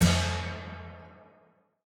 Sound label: ball gong